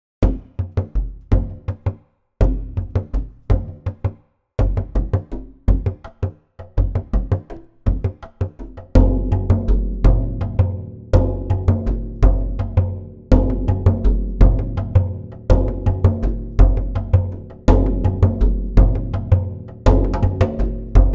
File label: Groove; Buffalo Drum RMX